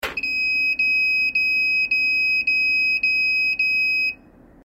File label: disconnected from battery